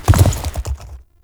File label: Horse Jump Land Heavy; Vehicles; Foley Actions; Warframe; Duviri